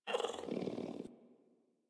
Puppy Idle Purr; Enemies; Vocals; Kubrow; Warframe

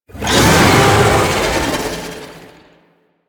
Tank Infested Vocal Attack Swipe; Enemies; Barks; Warframe; Lasrian

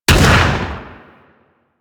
Hand Shotgun 2D Shot, Weapons

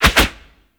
Melee Swipe; Enemies; Warframe; Necramech

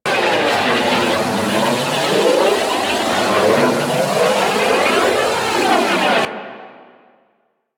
Missile Launcher Rocket Loop; Weapons; Warframe; Railjack

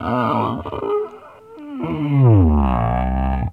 Landscape Mushroom Creak, Ambience

